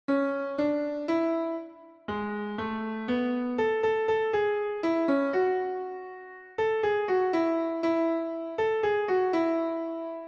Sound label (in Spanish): soprano, himnos en piano; 57 CANTA AL SENOR; cantos del camino